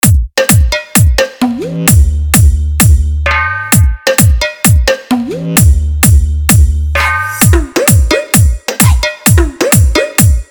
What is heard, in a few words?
Testing Beet - Mid Bass Vibration Beet EDM Mix; Dj Vikrant Allahabad